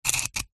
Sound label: foley brick knife tip score scrape scratch line